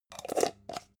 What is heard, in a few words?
jar plastic midsize close